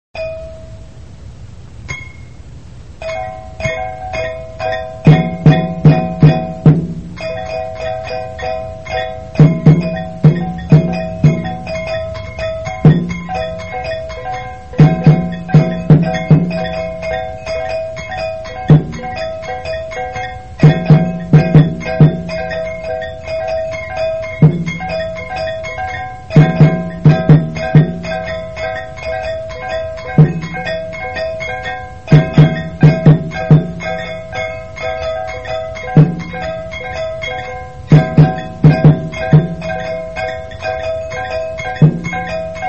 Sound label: taiko